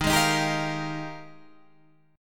F Minor 11th, guitar chord

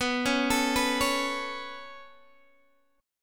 B 7th Suspended 2nd B down Guitar DADGAD; B7sus2; arpeggiated

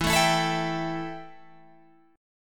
E Minor Double Flat 5th E Guitar Open G

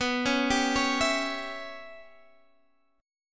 Db Minor 7th Flat 5th B

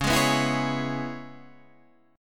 C sharp Minor 7th C sharp Guitar Standard; C#m7; strummed; JGuitar

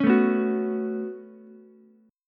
C sharp 7th Suspended 4th Sharp 5th down Ukulele; strummed; chord

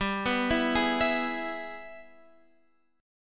G sharp Minor Major 7th Sharp 5th, chord

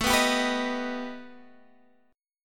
B Augmented 7th A

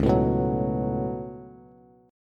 Db 7th Suspended 4th Sharp 5th Db down Bass; JGuitar

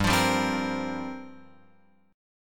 G Minor 11th, chord, Guitar Open F; Gm11 strummed; JGuitar

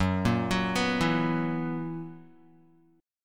Gb 11th Gb down Guitar, Gb11 chord; Gb11 arpeggiated; JGuitar

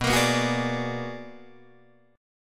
B-Minor Major 13th B down Guitar DADGAD; BmM13 strummed

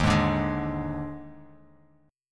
Eb Major 7th Suspended 4th Eb; strummed; 11,11,12,13; EbM7sus4

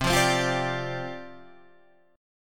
G 7th Suspended 4th C

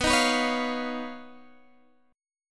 D sharp 7th Suspended 2nd Sharp 5th

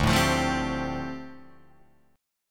D Minor 11th D down Guitar Drop D

